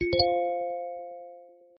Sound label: ring, notifications; Xiaolajiao LA2 S